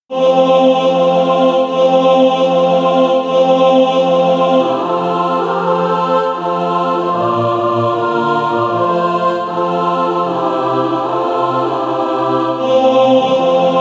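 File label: mixed, SATB Practise Music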